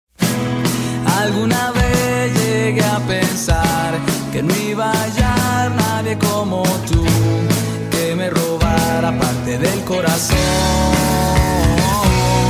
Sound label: vivo y71 ringtone